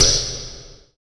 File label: KLOINK; Optical Media International; Sonic Images Library; SI FX Vol 7